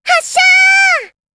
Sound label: charge